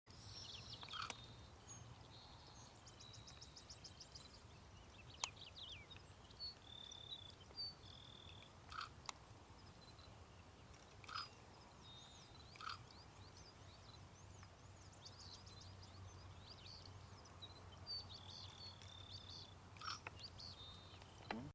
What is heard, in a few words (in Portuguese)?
Canto do galito